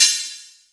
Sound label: Crash Cymbal